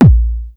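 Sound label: bass drum hard long; 300 Drum Machines; Yamaha Motif ES-Series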